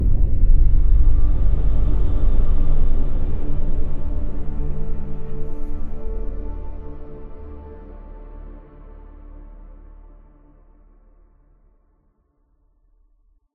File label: Combat End Stinger; Sea Of Corpses First Combat